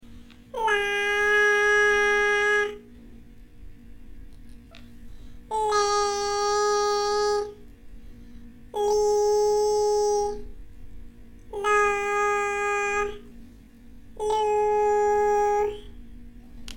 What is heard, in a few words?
Audacity Recording Middle Pitch